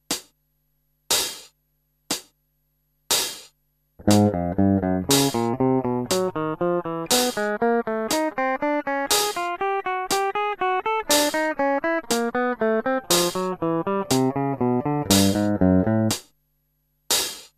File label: finger easy